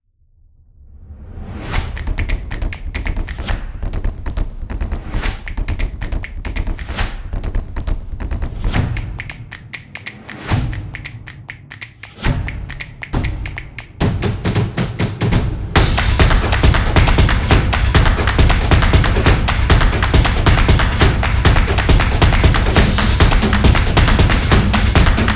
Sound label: Upbeat Fast